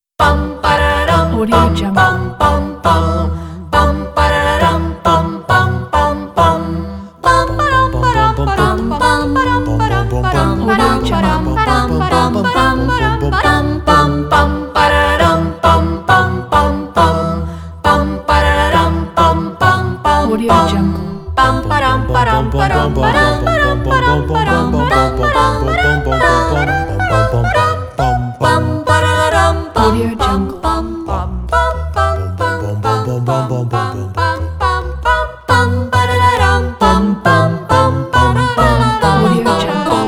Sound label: the nutcracker march vocal quintet